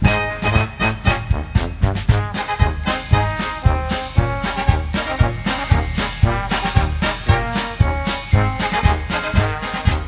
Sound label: ringtone